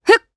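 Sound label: Vox Jump Japanese; Glenwys; kingsraid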